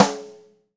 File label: Ludwig Supraphonic Snare Rimshot Room; Sonic Foundry (Sony Creative Software) - Vital Drums